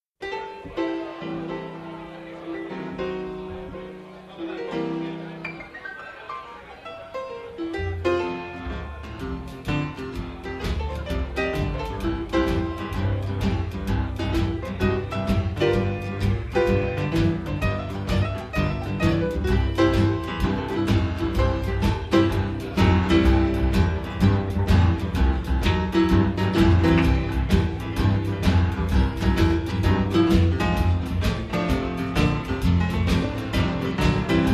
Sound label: Boogie Woogie Blues